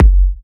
F#, Kicks; markus hakala

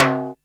Timbale Low, Drums, Roland MT32

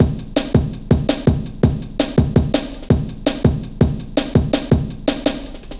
Ruffneck, Drum Loops 165.5; Jungle

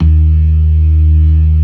ORGAN, LOWER MANUAL

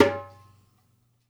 Dumbek; Essential Percussion

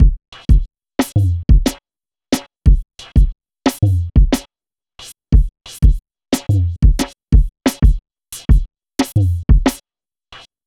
jungley 090bpm, Downbeat Dance Grooves; Zero-G

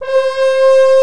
WINDS & BRASS

